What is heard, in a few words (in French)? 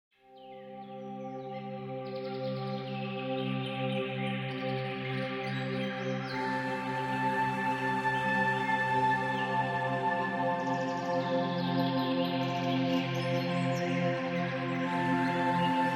Musique douce